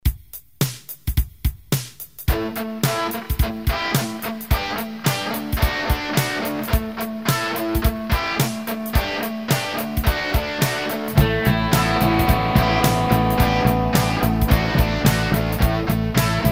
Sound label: rock n roll